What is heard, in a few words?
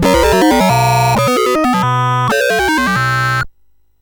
sid CSG8580R5; Brunhilde Loves Bruno